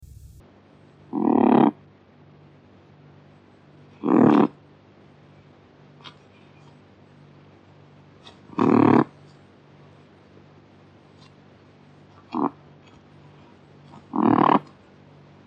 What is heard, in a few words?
kakapo